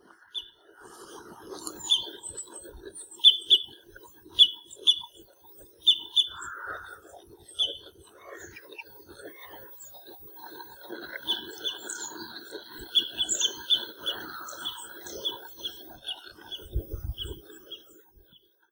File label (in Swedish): korsnäbb; fågelljud